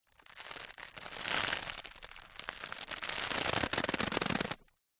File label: basketwork creaking